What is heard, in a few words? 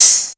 OPEN HH; drum